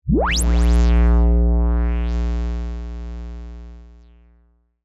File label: Synth, Stab, Pitch, Sweep; Stabs; BlastWave